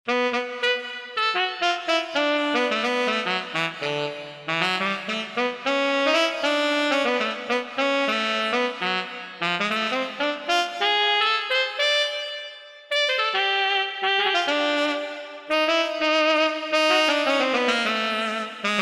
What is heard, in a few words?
Sax Wave; Korg MSC-1S Variety